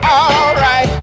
all right SUNG; can you dig it hon

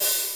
Cymbals

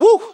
"woo", voice

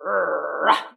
growl, Voice

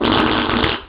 fart short

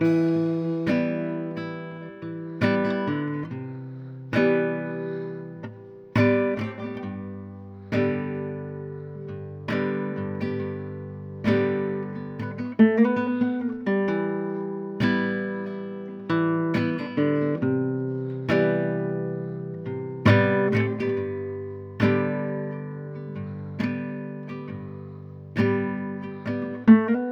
guitar, Sad

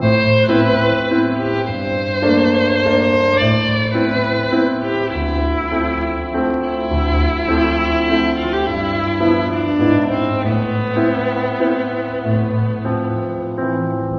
strings, sad

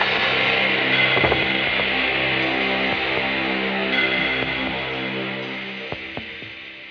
final dark note, stabs

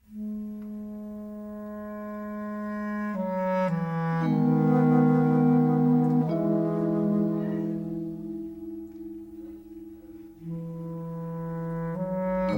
strings, Sad Loops

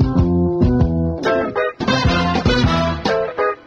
horns stabs, phonky loops

